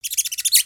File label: Pet Rabbit Speak